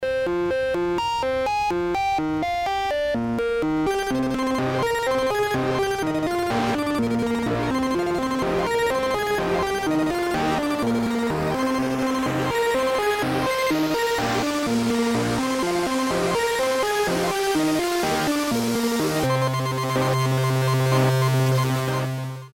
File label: boot audio